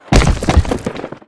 block

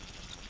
fishing reeling

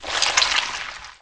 concrete; stone

